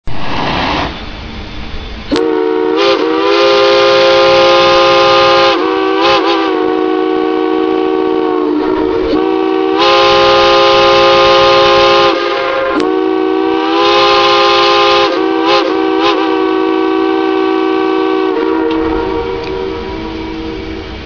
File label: horn